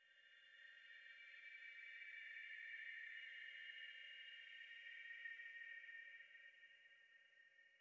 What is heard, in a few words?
Ambient Chimes; Summerset